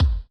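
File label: Flange Kick, Alesis SR16; FlngeKik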